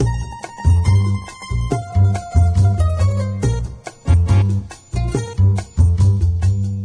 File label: Italian Music; Diner Dash 2 Restaurant Rescue